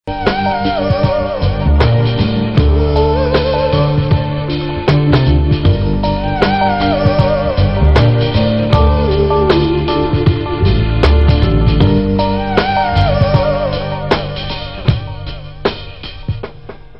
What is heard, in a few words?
ad tone; Ponds